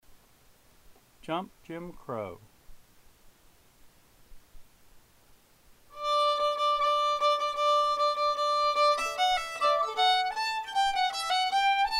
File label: fiddle; festival tunes